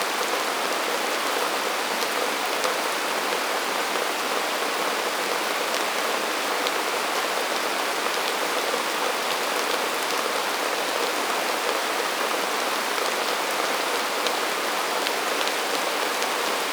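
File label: ambience rain medium outside forest on top of wood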